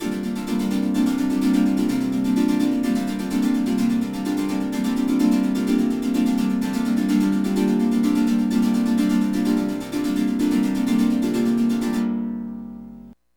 127, SYNTH SEQ; AMG; Global Trance Mission VOL-2

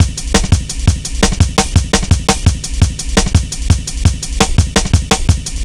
170, drum loops, drum & bass

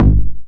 bassdrums, techno; Techno ID; single-drums

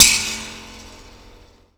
SPRING

